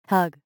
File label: hug